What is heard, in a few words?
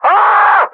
pain; custom monsters; mercs